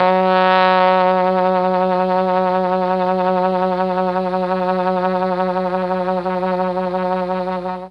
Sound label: F#2 TRMB TRMP, Mellotron; Mike Pinder - Mellotron Archive CD; TROMB+TRMPT